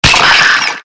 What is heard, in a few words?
skeleton hit; Skeletal Ravager